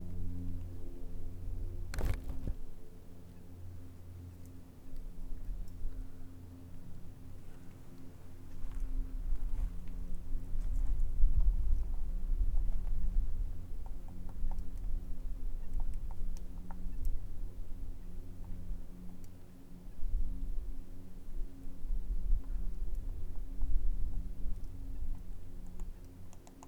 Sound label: Bryce Canyon